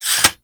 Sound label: Katana Sheath; Warframe; Weapons; Swords